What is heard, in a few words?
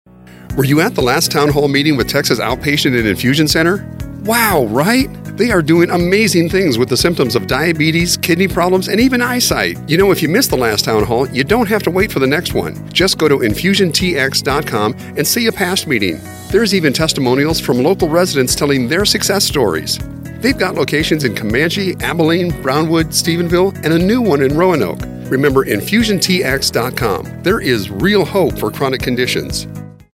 Music